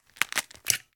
Cookies Egg Break

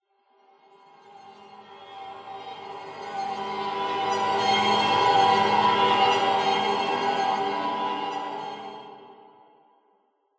High strings Sample

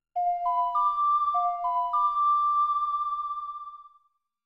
Ocarina ''; The Legend of Zelda Majora's Mask

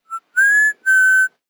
Flasher Whistle

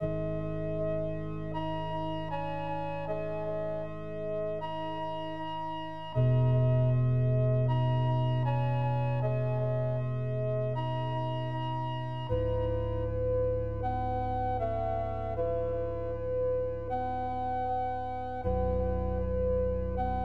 Conversation

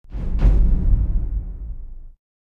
Click

